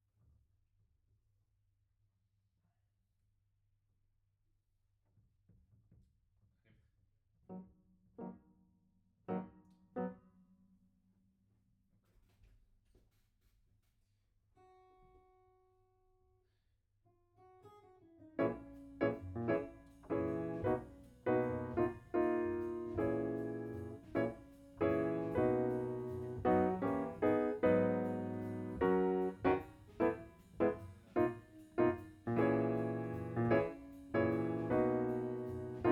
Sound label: Piano B